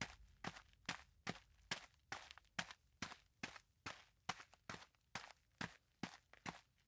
Footsteps; LOOPERS; Tyler

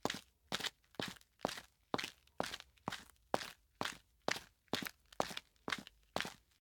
Footsteps Loafers; LOOPERS

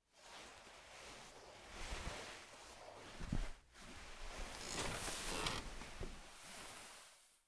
Get Up; LOOPERS

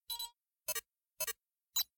Input Room Numbers; LOOPERS

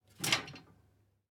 Touch A Bench; LOOPERS

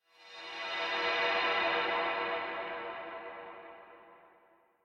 Go Through The Gate; LOOPERS

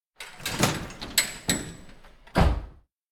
Taking Ambulance; LOOPERS

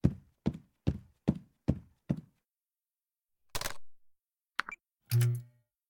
Turn Off The TV; LOOPERS

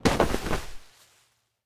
Hugging; LOOPERS

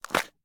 Ring Box; LOOPERS

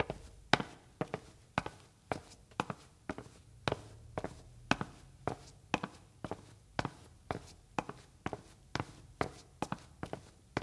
Walk Hard Floor; LOOPERS

